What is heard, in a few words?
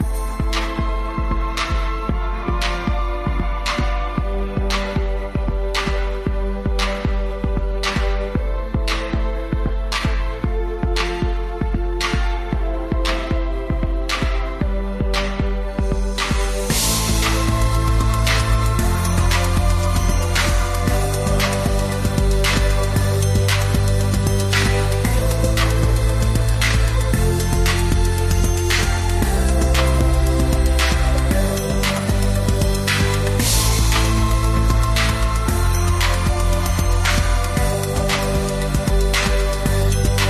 pop